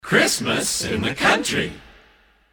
christmas kit group shout christmas in the country